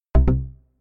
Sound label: failure to drop in atom; build-an-atom